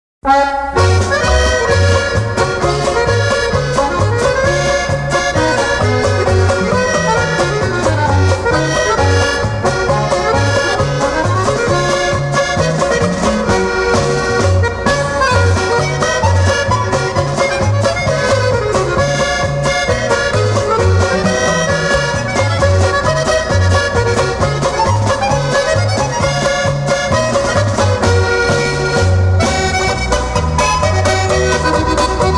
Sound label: Moon Run Polka, accordions